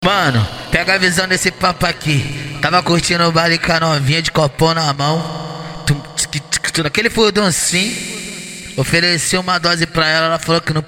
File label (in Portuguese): funk consciente e funk ritmado